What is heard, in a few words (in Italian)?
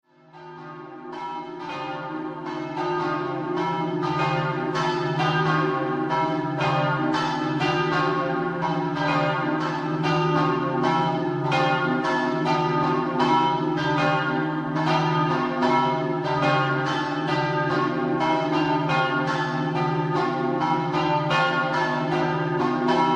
campane; CICONICCO